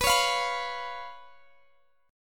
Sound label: B Suspended 2nd Flat 5th; Bsus2b5; strummed; x,x,21,18,18,19